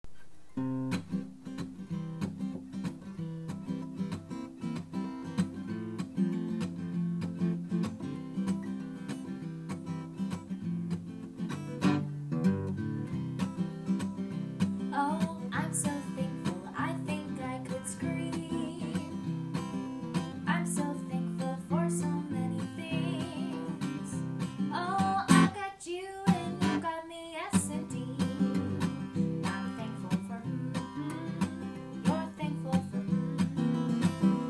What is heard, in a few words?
BLUES